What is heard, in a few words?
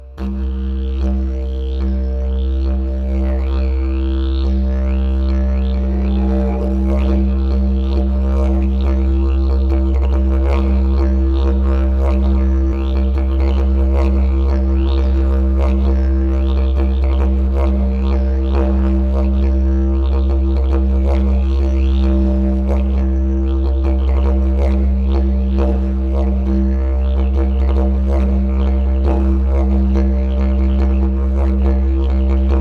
Didg